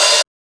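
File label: OHH, open hi-hat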